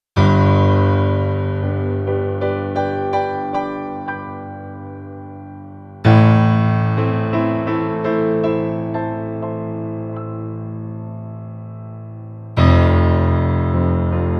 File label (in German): nord grand dynamik